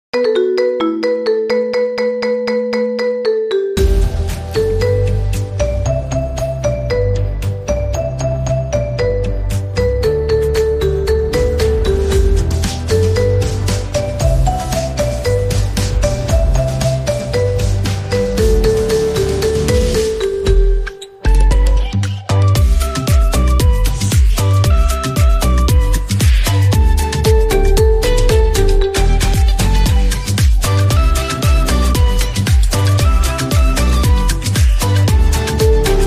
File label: Marimba Remix